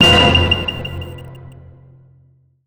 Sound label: Alerted State Signal; Star Trek